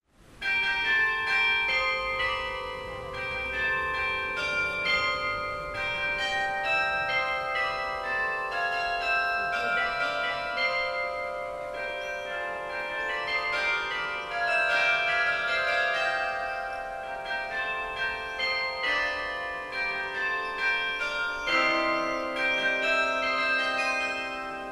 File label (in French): concert de carillon; CHAMBéRY